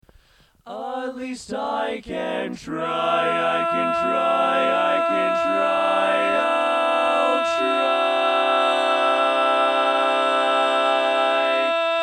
All Parts, barbershop

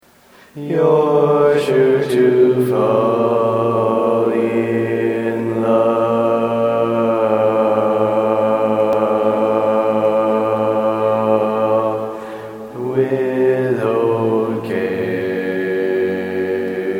Bass, barbershop tags

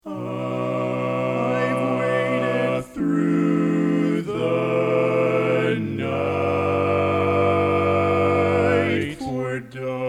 barbershop tags, all parts

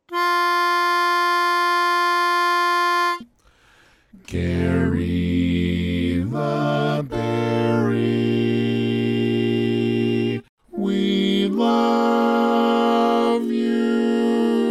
bass

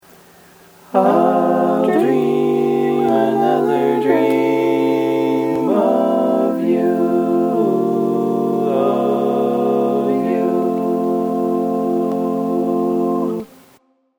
All Parts, barbershop tags

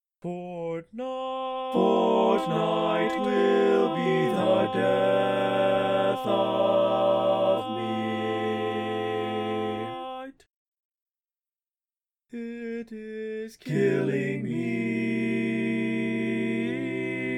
Lead, barbershop tag

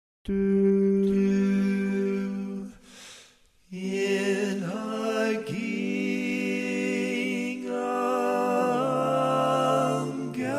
Lead

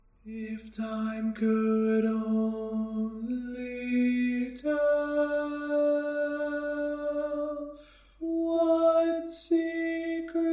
Lead, barbershop

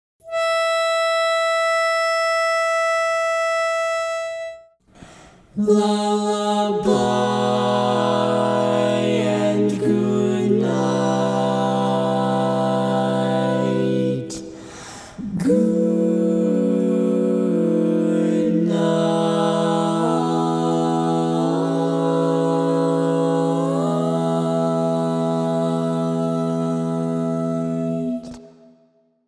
Female Tenor, barbershop tags

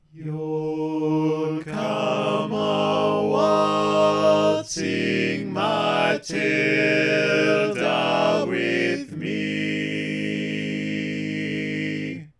All Parts, barbershop; Maloney's Version

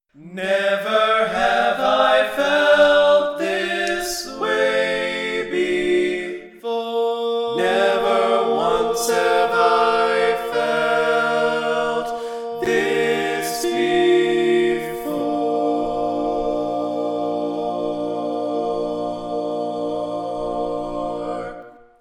Bass, barbershop

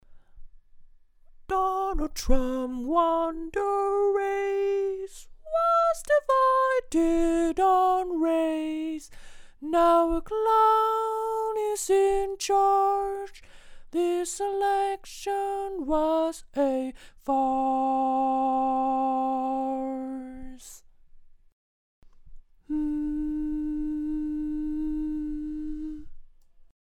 barbershop tags

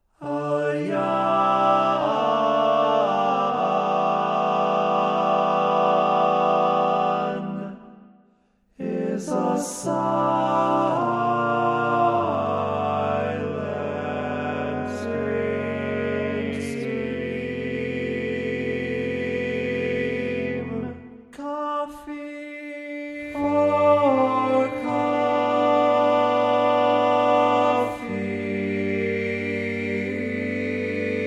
Tenor, barbershop

